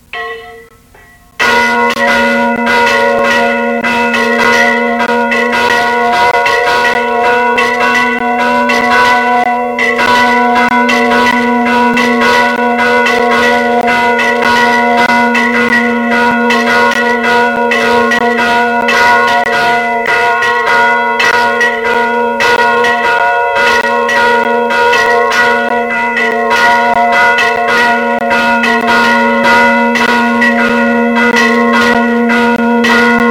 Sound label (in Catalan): campana